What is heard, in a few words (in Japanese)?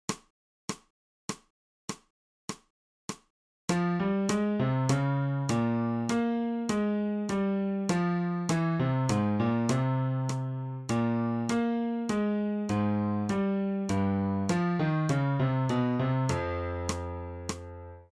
新フレットレス